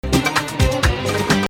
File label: Hijaz